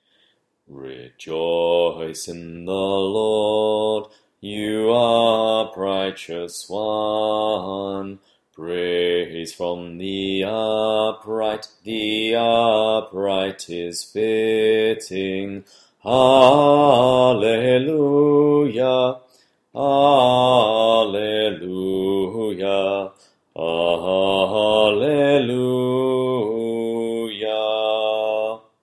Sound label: Communion Hymn; Saint